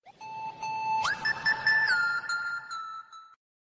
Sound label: Iphone Sms